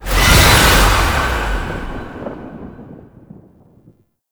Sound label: Impiety Impact; Warframe; Dagath